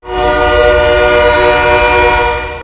horn

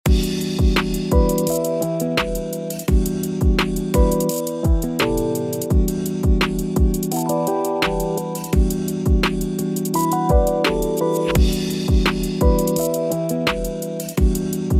LOOP BGM

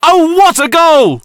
goal

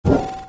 item spawn

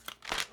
page sound

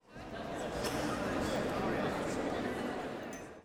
crowd Chatter theater; spellbound

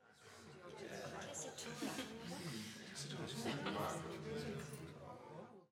crowd Chatter shrine; spellbound

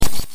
shockrifle remove

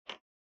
type; zara

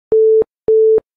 waiting

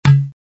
drum; go diego go; safari

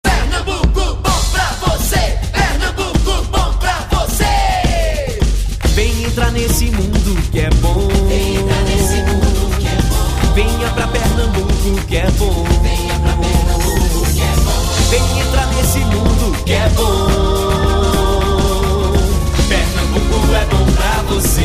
Jingles

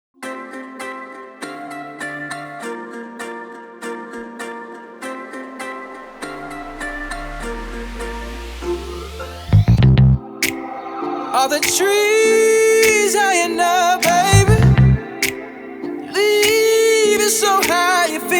music

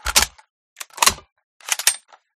reload; resurviv

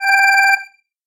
alarm

